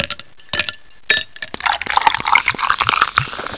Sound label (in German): eis drink